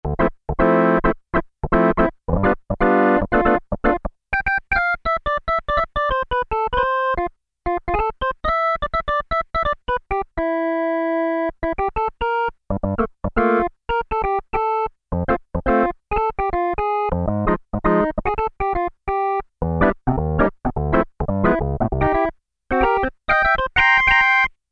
Organ Upper Manual, Korg Poly-800

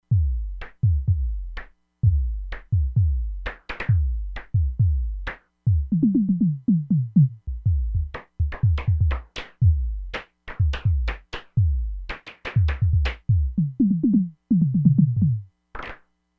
ELECTRONIC TOMS - NOISE CLAPS, Crumar Bit 99; Factory Programs

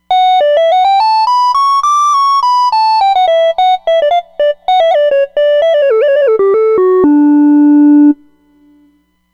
Peak Arp Sine

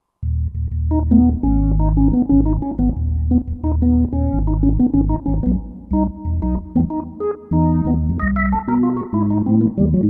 L100; '60s & '70s; NICE L100; Roland SR-JV80-08

